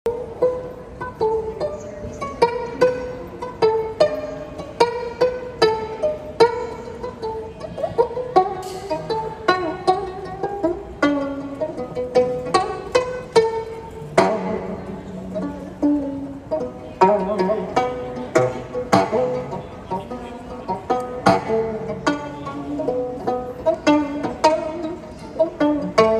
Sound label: Geomungo, ساز سنتی کره‌ای